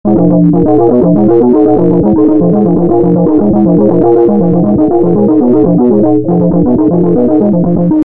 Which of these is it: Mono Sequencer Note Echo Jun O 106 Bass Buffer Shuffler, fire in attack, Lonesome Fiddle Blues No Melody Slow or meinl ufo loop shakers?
Mono Sequencer Note Echo Jun O 106 Bass Buffer Shuffler